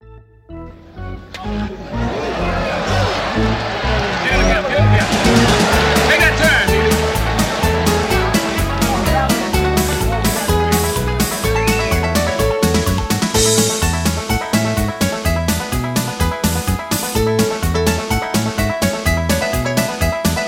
Instrumental

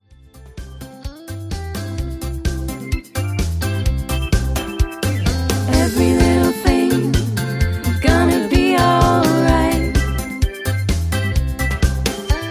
High Key Loud

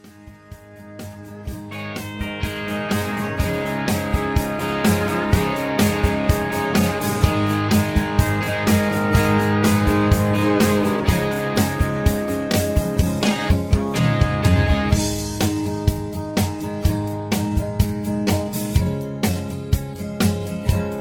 Instrumental Higher

